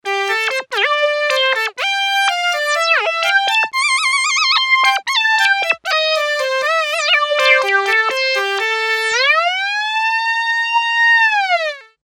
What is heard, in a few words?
rezo lead